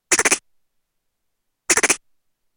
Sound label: squirrel sound